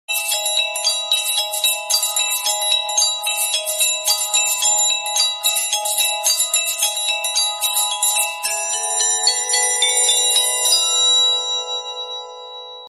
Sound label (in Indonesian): nada dering Blowing Dandelion Seeds; samsung Galaxy S3